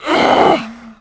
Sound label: death; quake2; fbetch